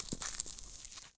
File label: ice; CS Level2